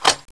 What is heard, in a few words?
foley